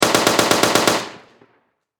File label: Warfare Machine Gun 7.92 Caliber Mp44 Stg44 German Long Burst Distant Perspective; SFX Bible